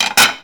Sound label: clear dishes; SpongeBob Diner Dash ios gamerip